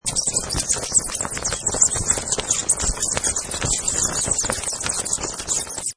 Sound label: Bats: Many bats squeaking excitedly. No wing flapping sound.; Bat Sound Effects; Bats (Sound Effects:An